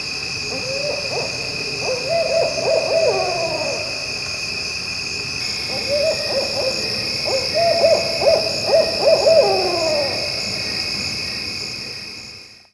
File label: Owl Call